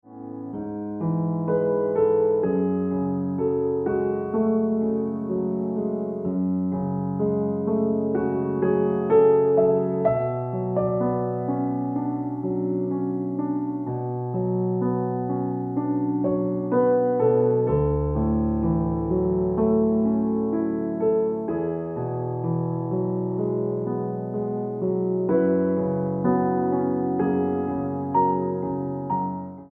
Ringtone Piano Music

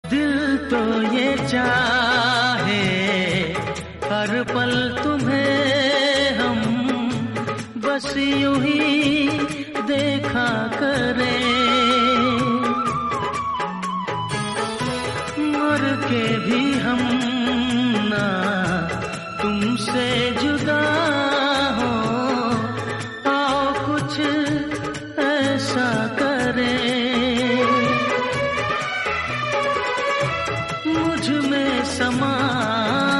Hindi Song

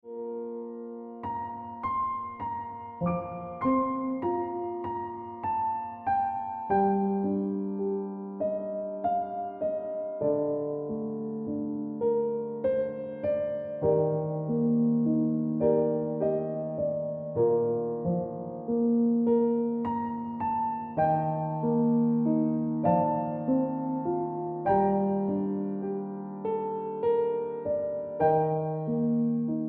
Piano Music

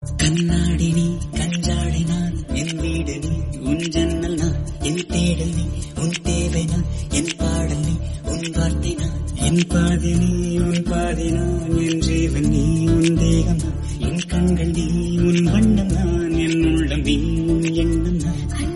Song Tamil

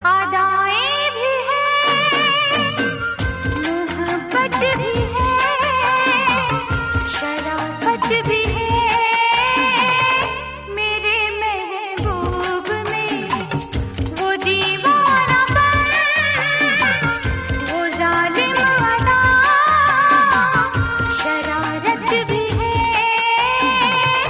Hindi

